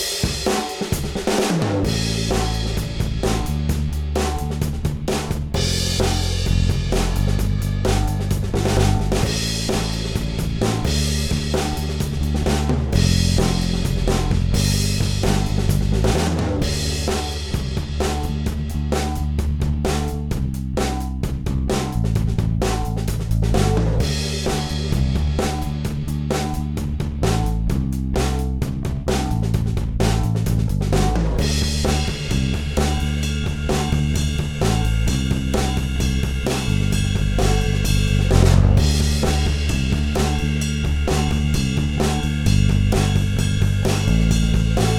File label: DnB